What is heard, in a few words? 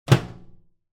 Household Door Close Microwave